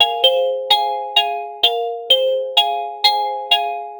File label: loopable ringtone cute dy